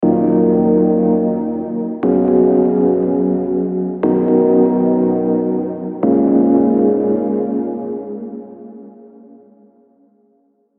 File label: Aeolian Prog; Unison Aeolian Advanced Scales MIDI Collection